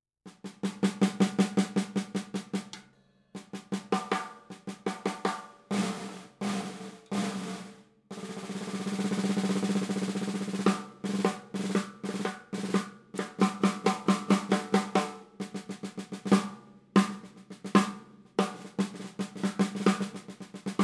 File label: 5x14 Slingerland TDR 70